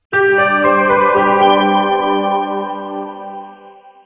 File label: jingle; voicexml